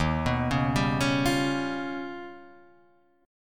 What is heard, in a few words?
D sharp Augmented 9th D sharp